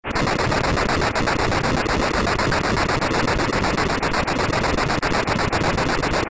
constant punching; ff3us

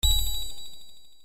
ting; ff3; ff3us

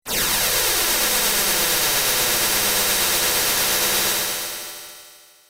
machine; ff3; ff3us

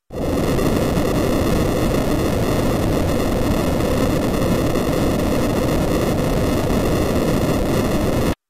earthquake; ff3; ff3us

